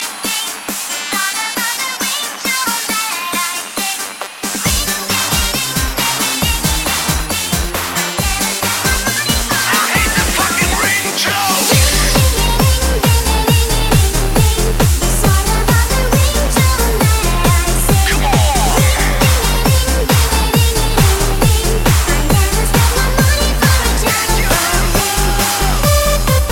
Ring Dinge Ding

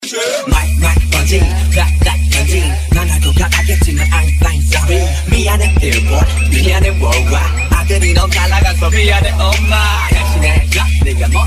Jingle Bells Drop

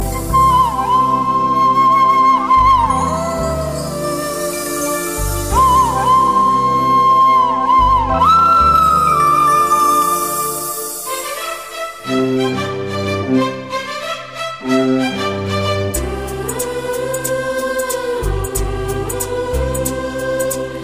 Romantic Tone

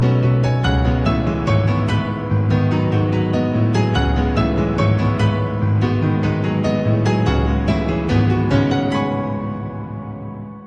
piano

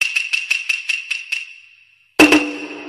Japanese Percussion

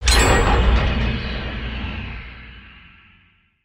Generator Done; DBD

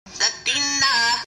Latina

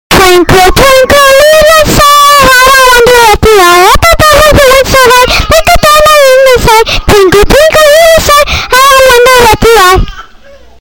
Twinkle

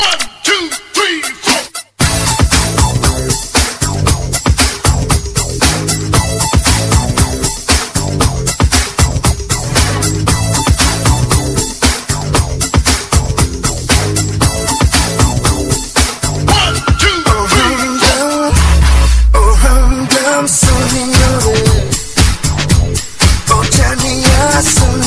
Rock

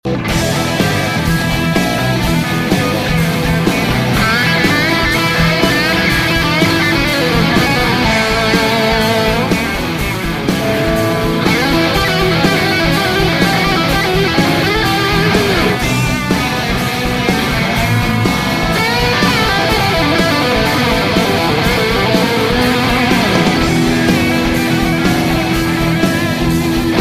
crazy Guitar

